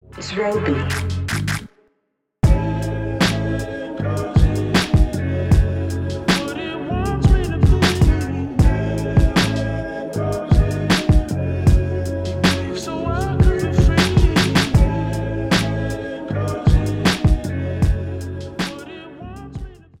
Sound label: 78bpm, musique